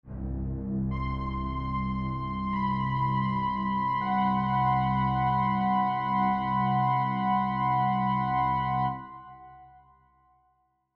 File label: musical haunting